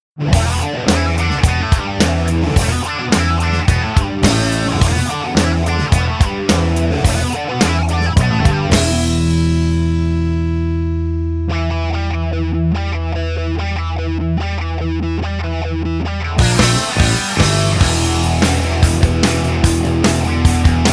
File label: Spring Break Rock